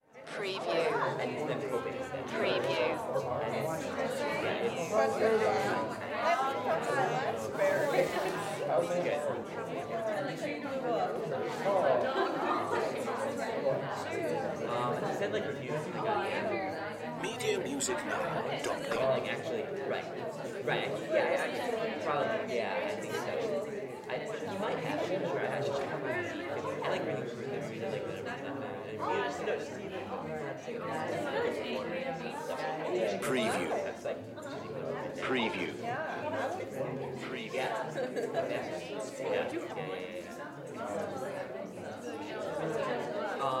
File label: High School Crowd